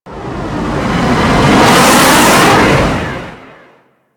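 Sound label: Stanchion Ship Rocket Projectile Whizz By; Warframe; Vehicles; RailJack; Proc Ships; Corpus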